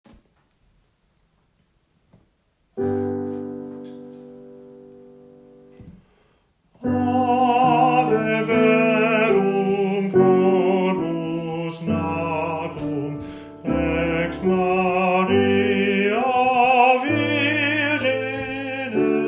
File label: Ave Verum tenor; Elgar